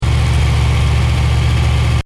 Engine: Medium Revs Loop, car; Vehicles; Car Sound Effects; Cars (Sound Effects:Vehicles); Stuart Duffield

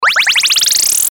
Ascend Bounce Spinner; Blastwave

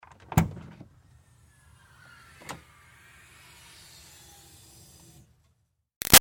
Car Highlander Trunk Open; 2005 Toyota Highlander V6; Cars; Vehicles; BigRoomSound

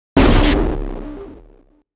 Hit Static Glitch Fuzz Delay; Blastwave

